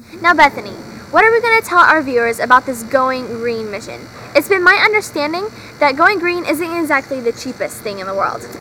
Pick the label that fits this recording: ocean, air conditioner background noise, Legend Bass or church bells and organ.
air conditioner background noise